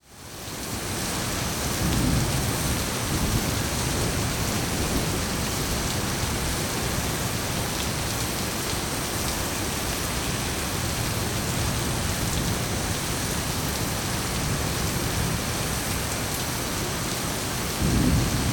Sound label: Weather Ambience Rain Forest Jungle Insects